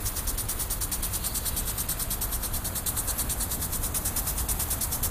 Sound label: sprinkler